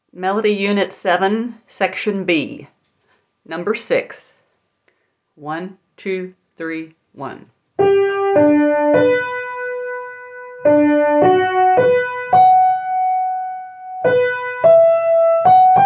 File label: melody, ear training recordings